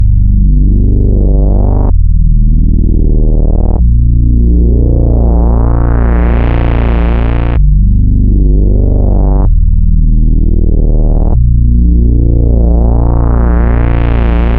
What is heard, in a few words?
techno rising long bass; instruments; loops; 128bpm